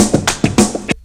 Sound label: TURN 100, Hip Hop; E-MU Formula 4000 Series Vol. 1; Hip Hop Nation; Turnarounds